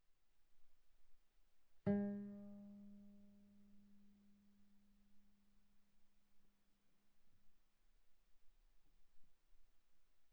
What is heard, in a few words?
Viola pizz sul A pp A4 B4 stereo